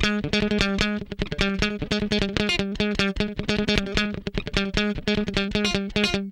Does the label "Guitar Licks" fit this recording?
Yes